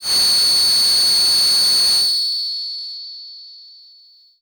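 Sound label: Breath V DX100 C#7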